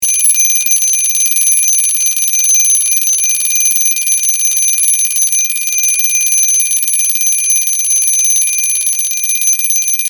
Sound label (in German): weckton; deutsches uhrenmuseum